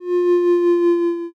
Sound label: boo